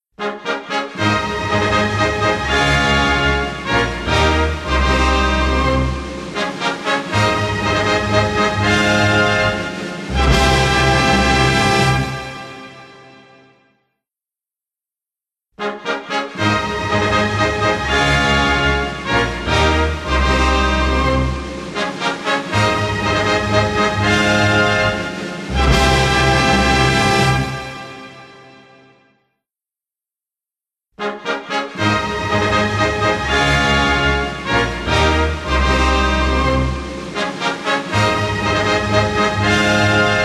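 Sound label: New Year fanfares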